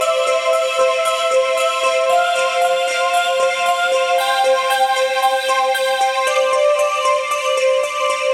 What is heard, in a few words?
115 A Seq; Midnight Run; Zero-G - Future Wave